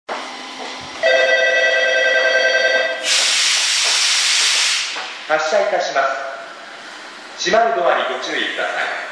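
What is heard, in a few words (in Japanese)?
駅放送, 発車 静か; funabashi-nichidaimae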